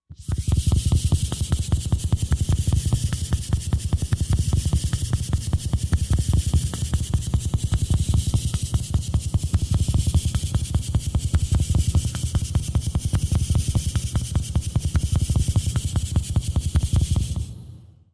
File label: Pulse Percolator Mach, electrical